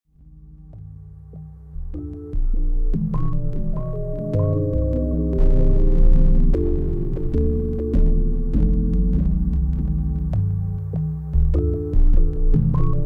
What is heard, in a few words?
noise consists of random frequency heard at the same time; Loathing Robots